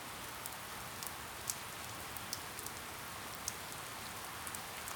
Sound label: light rain; weather; CosmicRage